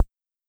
Kick, Wurlitzer Swinging Rhythm drums

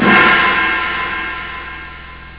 hihat crash; Amiga Soundtracker Sample Packs

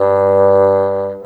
Bassoon, Orchestral, Rock Pop; Sonic Foundry (Sony Creative Software); Orchestral Series VOL-4; Rock and Pop; Rock-Pop 04

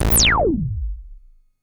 Juno Impact

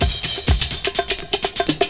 PERCS, 70 s Breakbeats

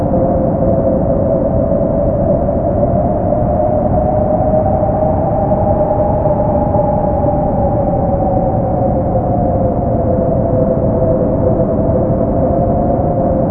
stereo wind; Zero-G Ambient 1; STEREO WINDS

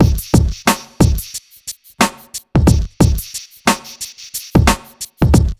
90 BEAT, Hip Hop R n B; USB Soundscan vol.19; AKAI

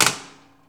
TESLA, Science Fiction; E-MU Producer Series Vol. 3 – Hollywood Sound Effects; Tesla 10 -19

